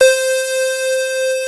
FUZZ LEAD, PPG, Analog; USB Soundscan vol.49 - Analog Fantasies; AKAI

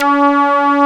SYN, SYN Analog

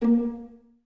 Violas A#3 Pizz; Miroslav Vitous; String Ensembles

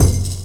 hit, PERC; Techno Trance Essentials

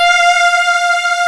SYNT F3, SYNTH; AKAI S-Series CD-ROM Sound Library VOL-2

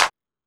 Techno, EMU XL-7

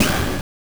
Orch Hit low; TMNT; M8; Genesis

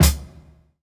Kick, jungle breaks drum sounds

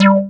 SQ-MATIC C5, BASS; Q Up Arts; Dream Experience

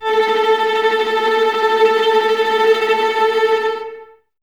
VLS A Tremolo, Violas, String Ensembles; Miroslav Vitous

